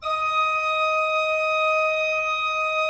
D5, Cathedral Organ; ILIO; Propeller Islands

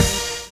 STAB; Univers Sons

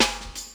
Snare, Ensoniq ASR-X

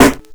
grind, EMU XL-7

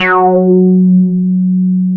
F#4, Bass; E-MU Producer Series Vol. 6 – Analogia Project (CD 1); Boom da Bass 2

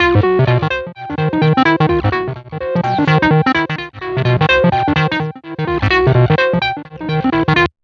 127 sound 1 L, Fresh Disco House; USB Soundscan vol.20; AKAI

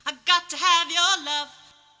Sing Voice Gotta, Choir

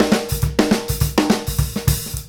FILL

